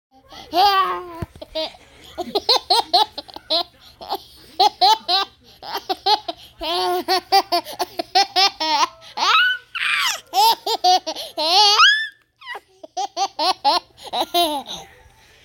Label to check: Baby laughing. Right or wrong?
Right